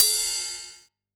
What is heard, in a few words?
Ride; drumnbass 170bpm; Drum Kits; musicradar; Drum Hits B